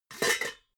pots clank soft

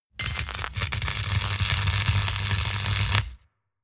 spark

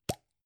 gum plastic box open

